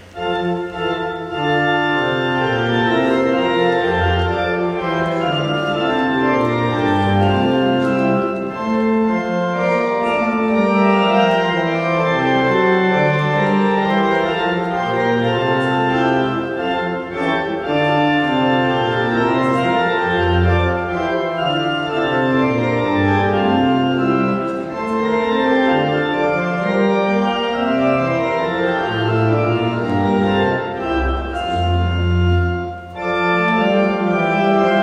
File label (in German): orgel postludium